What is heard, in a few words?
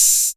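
808 open hi hat Tape; Goldbaby Tape808 & Tape909; The Tape 808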